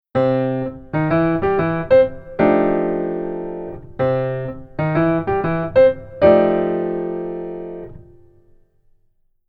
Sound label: Keyboard